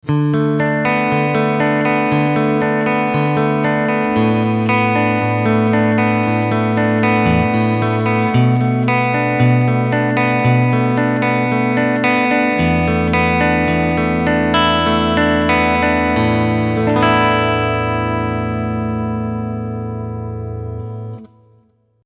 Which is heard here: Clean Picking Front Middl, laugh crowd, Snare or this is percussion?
Clean Picking Front Middl